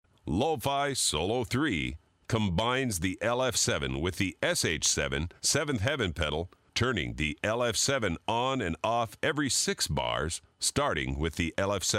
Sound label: Lo-Fi Solo